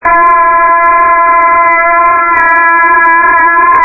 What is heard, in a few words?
full family scream in car